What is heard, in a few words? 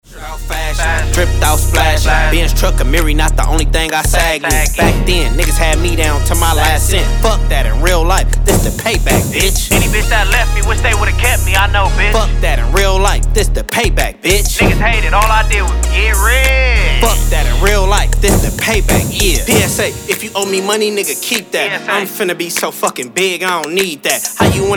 HIP HOP